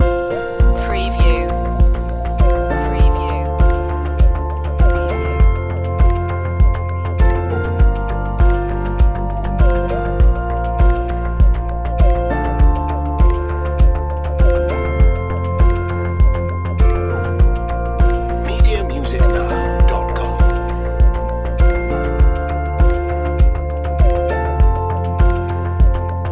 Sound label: wake loop